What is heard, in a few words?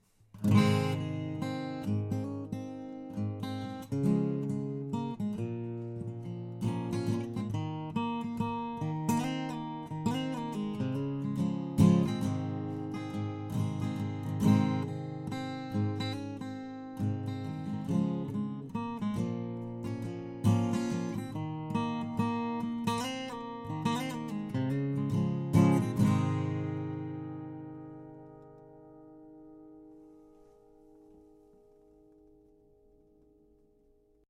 Stumming